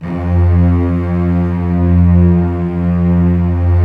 STR SEC, STRINGS; Roland - LA Composer vol. 1

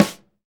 SD, KIT Real-Kit; 90 s Sample CDs; Sound & Vision - Gigapack I CD 1 (Roland); Real-Kit m 6

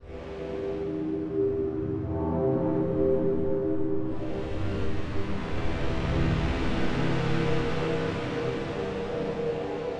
Drama Pad, cinematic soundbeds; musicradar

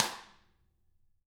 Drums, SNR; CAMCO

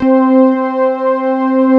SYNTHS; Pro-Rec - Synthsonic; JV ANALOG

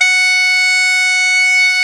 CHANTER, Bagpipes; E-MU Formula 4000 Series Vol. 4; Earth Tones

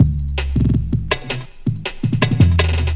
Rawz 162, breaks; minihalfs

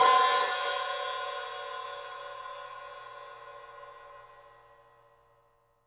Studio Hats, 3DPercussives

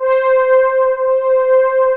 MELLOW, YAMAHA; Analog Fantasies; USB Soundscan vol.49; AKAI

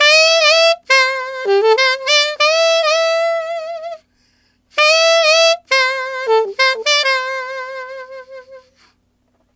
Alto RnB C maj, Sax; Sonic Foundry (Sony Creative Software)

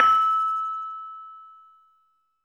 LAMEL E5 -L; USB Soundscan vol.60; AKAI; LAMELOFO

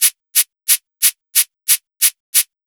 DRMS, Pure Electro; USB Soundscan vol.03; AKAI

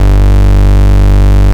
Kick Frenchcore Fail Bass Gs

